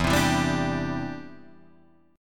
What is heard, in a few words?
E Minor 7th Sharp 5th E